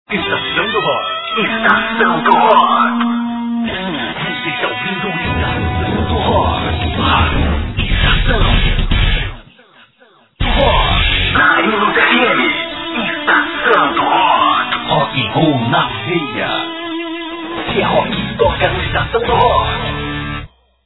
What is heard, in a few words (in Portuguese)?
vinhetas estacao